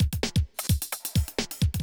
electro kit 130

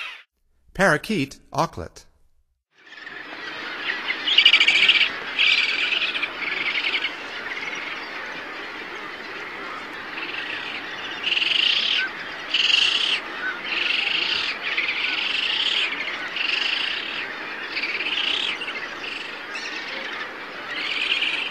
Parakeet Auklet; North America; Stokes

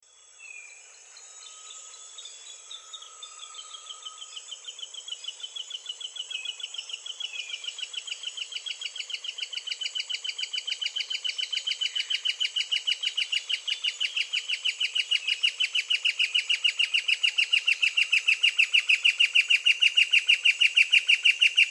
Tawny-winged Woodcreeper, S. America

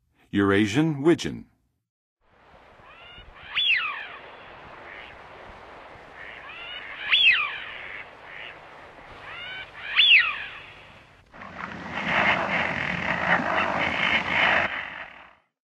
Eurasian Wigeon; North America; Western USA; Western USA Songbirds

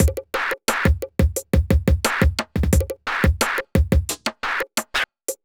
r and b

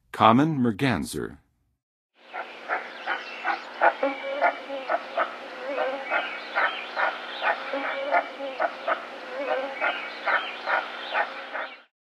Common Merganser; North America; Western USA; Western USA Songbirds

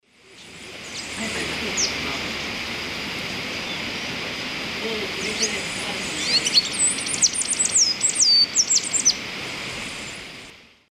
Thraupis palmarum, dawn song; S. America; Eastern Lowlands

